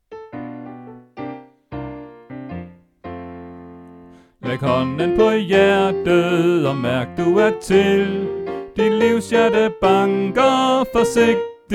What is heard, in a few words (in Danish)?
Sopran; oevefiler